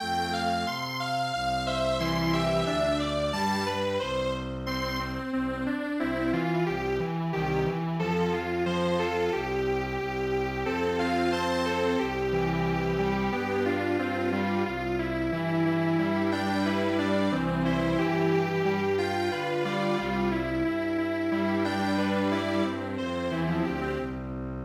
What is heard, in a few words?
Strings Quartet; traditional